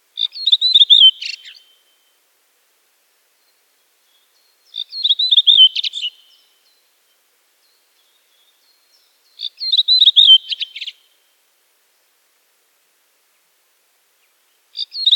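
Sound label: redwing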